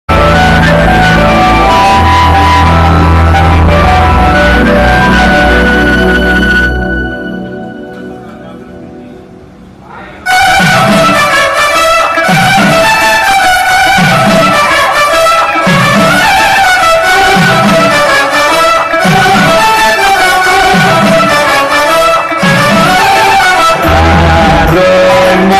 ambajipeta marriage band heroine entry bgm ringtone; ambajipeta marriage band movie